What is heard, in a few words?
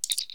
Communicator Chirp; Star Trek